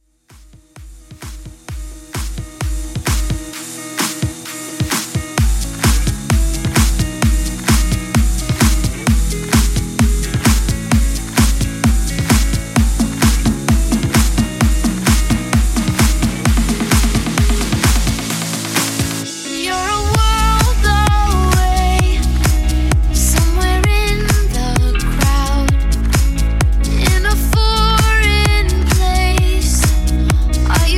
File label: Top40 Festival